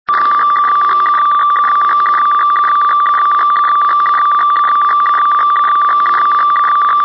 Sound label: hf fax